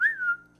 Attention Whistle

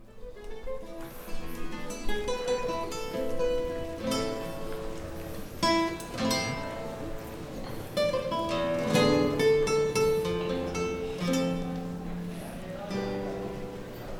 Street Musician Classical Guitar

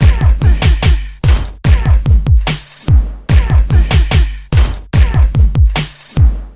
Drum Loop

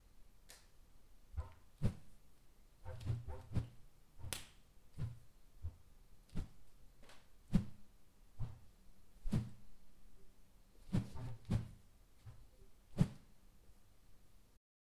Whoosh; Trevor Webb

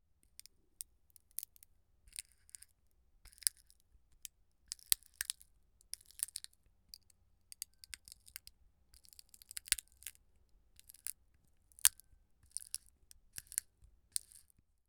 Pecan Nuts Cracked Open

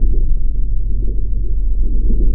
Seismic loop

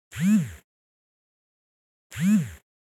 Vibration Cellular Phone Medium Buzz

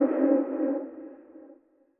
Convoluted Bare Bone Loop 60 Bpm

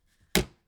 Old Suitcase Latch Open

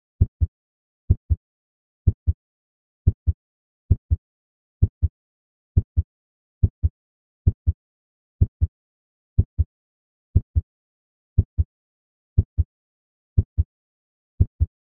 Heart Beat

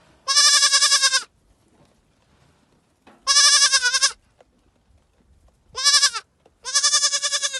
Sheep Sound

